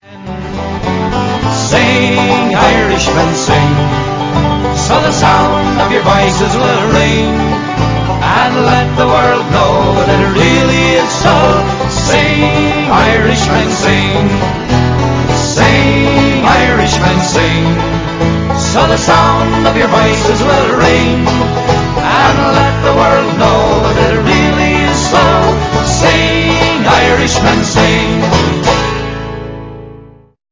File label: sing irish